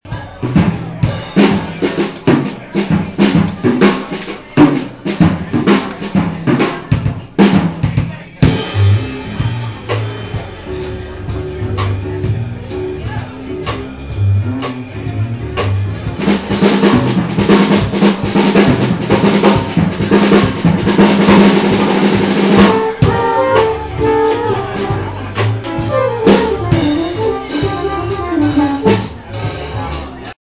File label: jazz